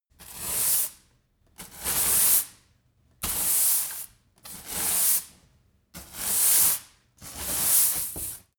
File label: broom sweep sequence bright